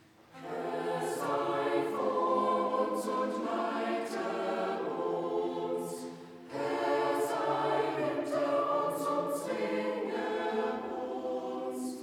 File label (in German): Chor; Irischer Segen